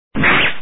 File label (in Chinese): 连中; Samurai vs Ninjas